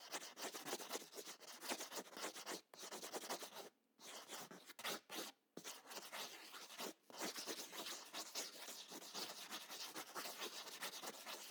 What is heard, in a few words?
writing loop